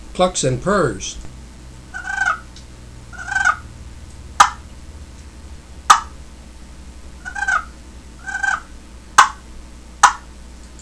super yelper cedar clucks purrs, turkey

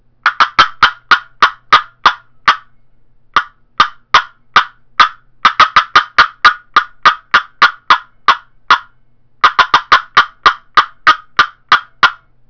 poplar purpleheart cutts, turkey; Southland Sounds

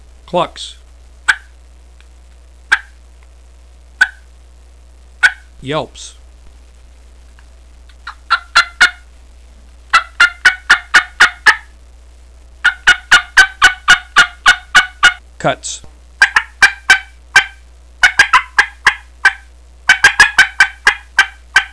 two all

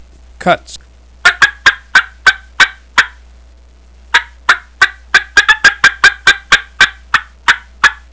primos true double 2 cutts

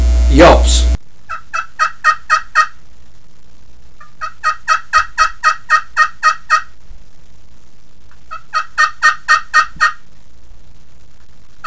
alum yelps, turkey; dixie

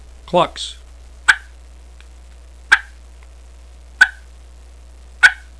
clucks

